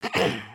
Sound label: bored throat clear; emotes